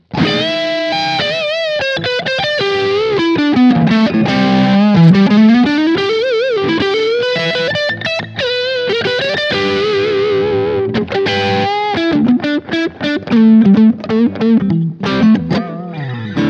Ibanez AZR420 Parallel Coils Neck to Bridge Highgain